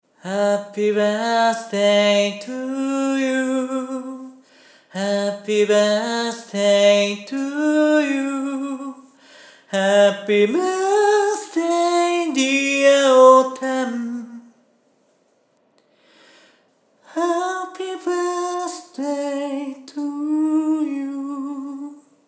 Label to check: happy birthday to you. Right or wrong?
Right